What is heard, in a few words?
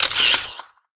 SCREENSHOT